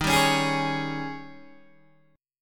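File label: E flat 11th E flat, down, Guitar, DADGAD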